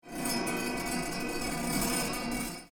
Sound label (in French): Frottement symbale; design sonore